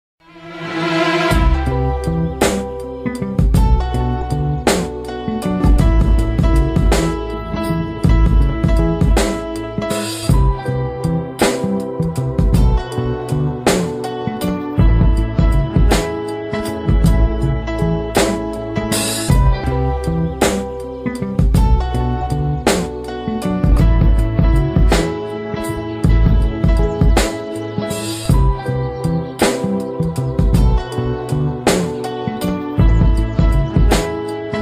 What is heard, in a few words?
instrumental